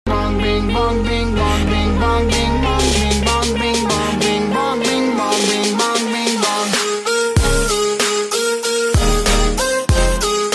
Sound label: Bing Bong Song